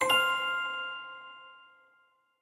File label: times up